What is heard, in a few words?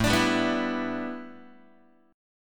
A 7th Suspended 2nd Suspended 4th A, Guitar; strummed